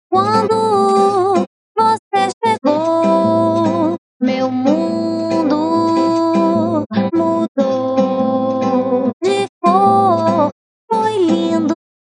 noise solo

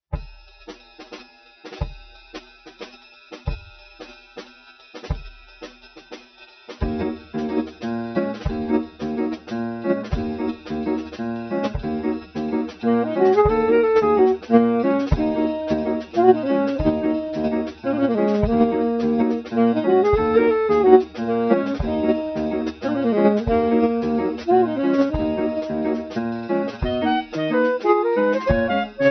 harmonic

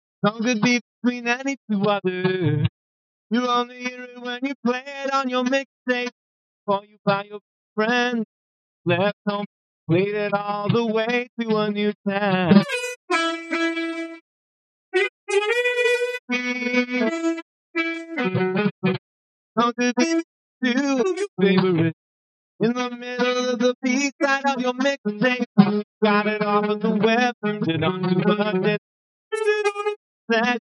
solo

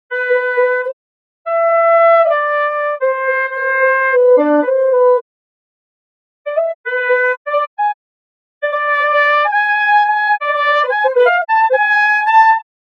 solo

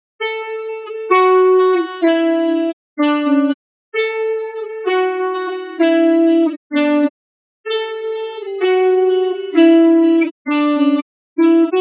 solo

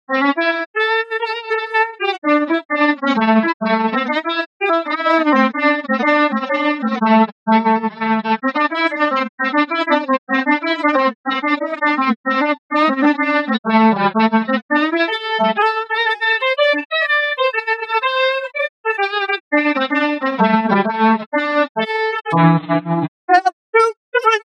Free Music solo

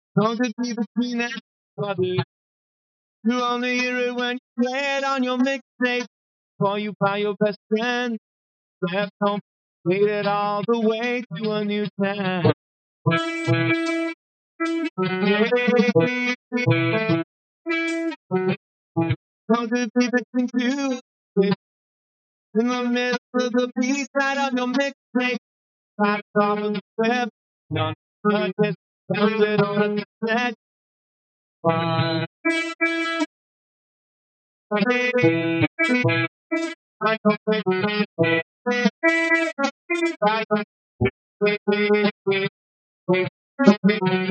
solo

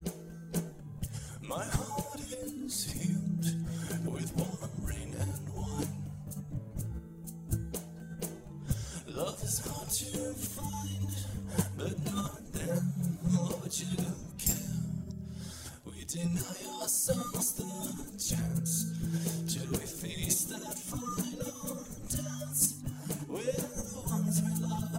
percussive

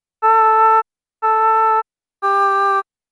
Sung Vowel

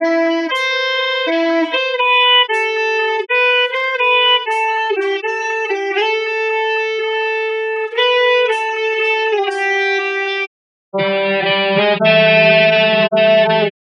natural minor solo no F0

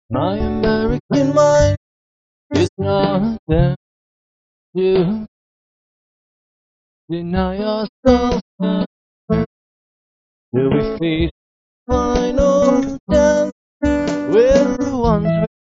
solo

